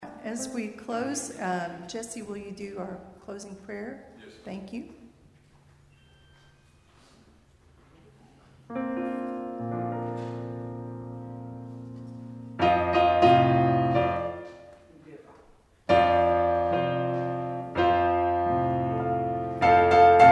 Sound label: Closing Hymn